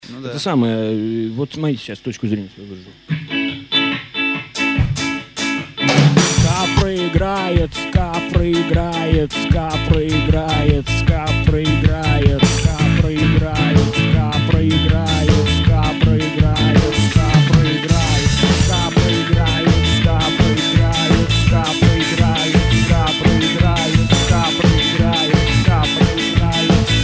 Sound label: ska